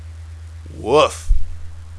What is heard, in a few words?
bark